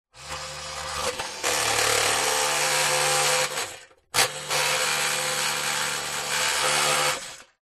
industrial power tool vibrate grind metal motor hum 2x short bursts